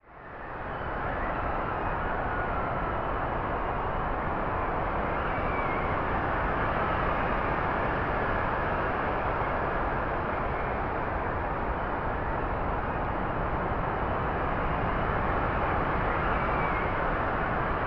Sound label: Weather Ambience Hurricane Storm Wind Strong Gusts Whistle; AdobeCC